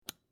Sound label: Flip Switch Down, A basic light switch click sound; Light Sound Effects; Household; LynneMusic